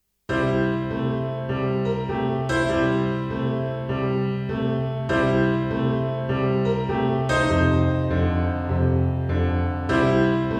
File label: piano pop